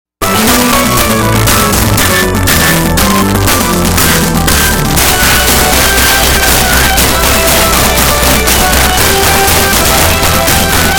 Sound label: regular pulse; debate